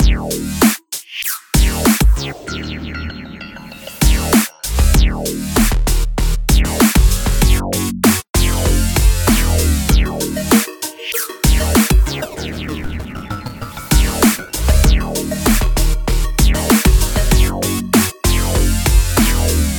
emote robot music; athena; left4dead2; fortnite emotes

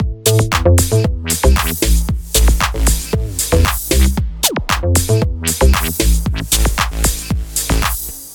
emote floss music; athena; left4dead2; fortnite emotes

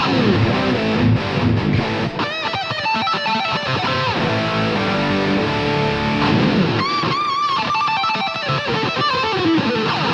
emote shred guitar mix loop; fortnite emotes

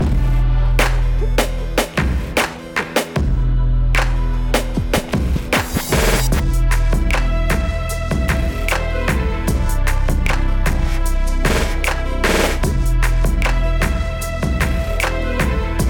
Hip Hop Good Vibes Mix Loop; fortnite emotes

